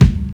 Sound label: thumpy kickdrum c sharp key, Loudest frequency: 278Hz